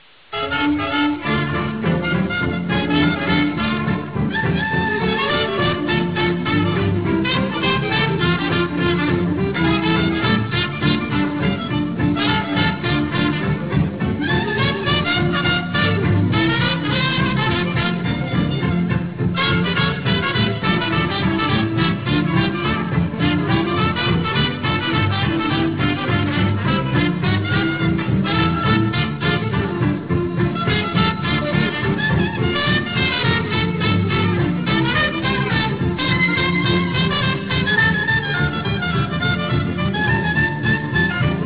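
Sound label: fight music; hobo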